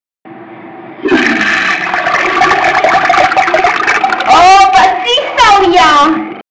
fart

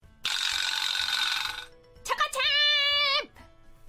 alarm; watame

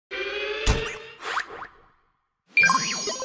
hitting the button; monokuma

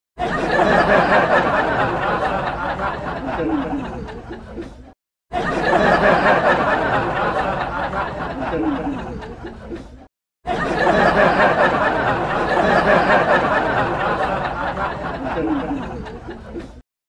audience laughing, audiencia risa

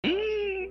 huuuummmm

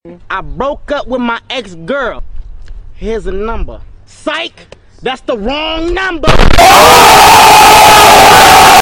ohhhhhhhhh

